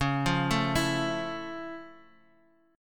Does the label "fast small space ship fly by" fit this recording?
No